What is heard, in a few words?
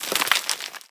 debris dirt, bullet impact debris